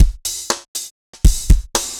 dance floor tight 120, loops; 120bpm

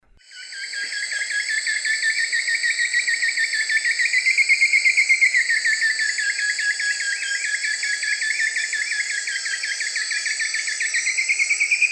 Xiphorhynchus elegans, song; S. America; Eastern Lowlands

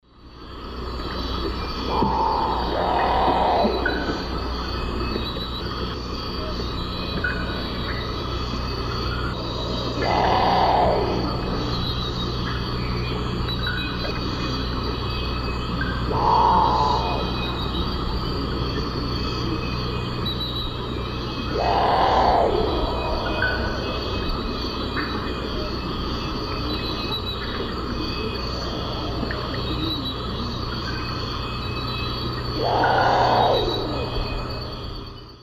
Nyctibius grandis, songs 2 birds; S. America; Eastern Lowlands

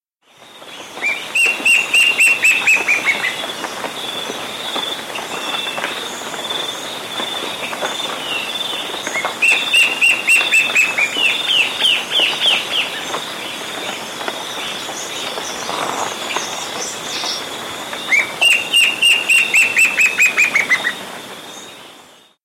Parker's Antbird, S. America

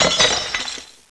GLASSBRK

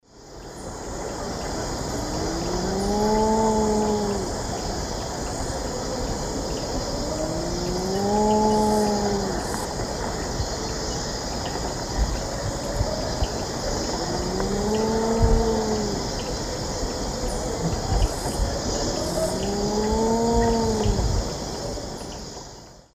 Tigrisoma lineatum, calls; S. America; Eastern Lowlands

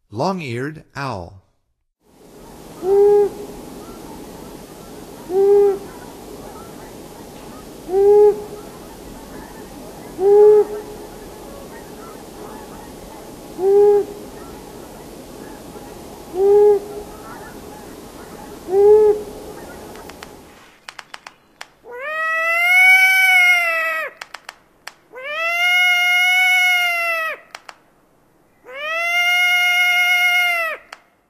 Long-eared Owl; North America; Stokes

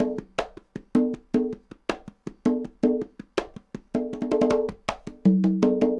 cong bongs 80, latin, 80bpm

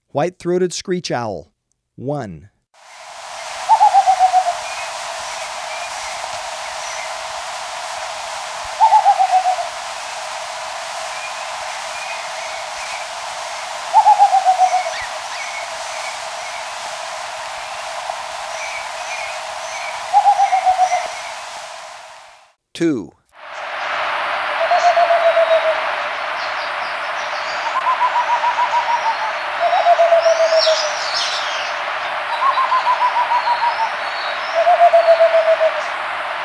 White-Throated Screech-Owl, Birds of Ecuador; S. America

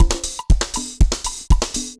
dancefloor perc 120 bpm

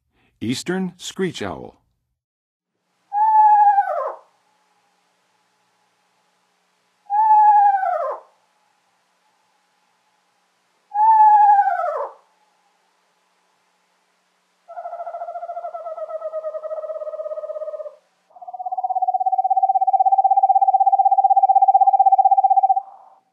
Eastern Screech-Owl, Birds of North America; Western USA; Western USA Songbirds

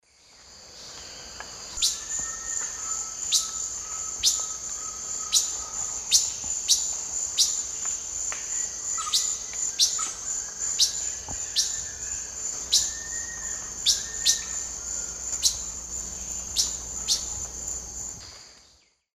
Cyanocompsa cyanoides, calls; S. America; Eastern Lowlands

